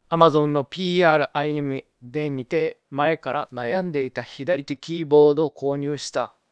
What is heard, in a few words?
emo anger